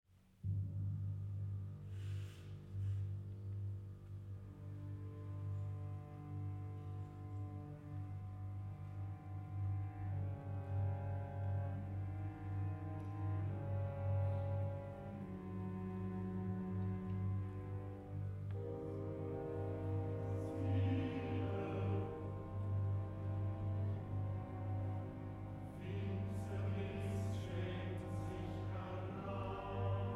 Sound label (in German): Chor; Das Volk